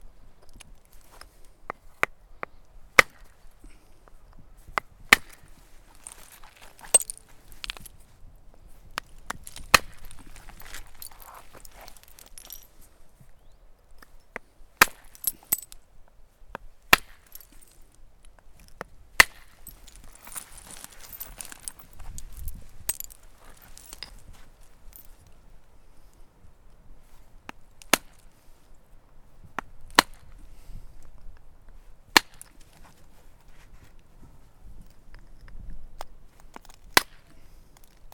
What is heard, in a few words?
Elk against flint